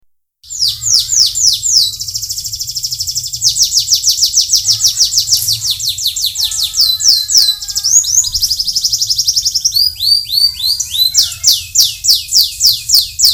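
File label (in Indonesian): kenari, canary; haneda; om gatot pw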